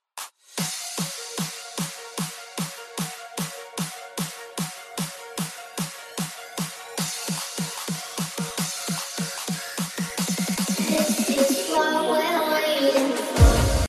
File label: hard style ringtone